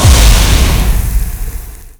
burn, explosion

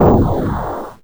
missile fire

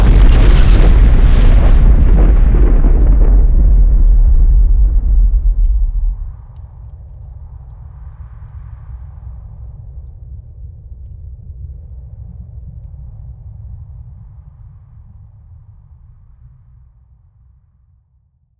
ultra explosion

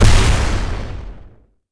small explosion

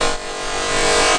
saw, weapon

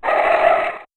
chicken flyer big

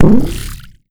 sonic gun, weapon

